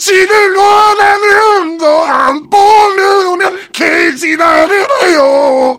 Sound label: heavy singing